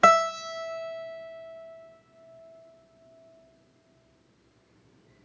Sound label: string 1 fret 1